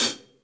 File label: Hihat Pedal Session Dry; Drum Hits; Standard Hits; Ableton; Factory Packs; Session Drums Studio